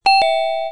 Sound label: correct